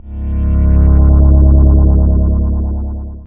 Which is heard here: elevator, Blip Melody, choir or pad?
pad